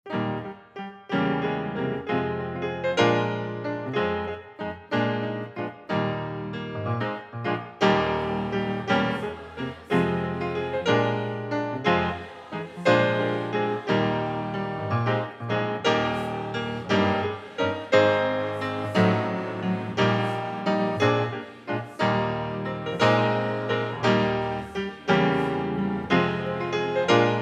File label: Piano